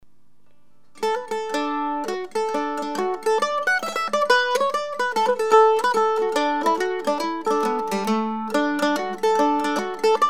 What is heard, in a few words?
Hornpipe, custom mandolin; Peter Coombe Mandolins and Guitars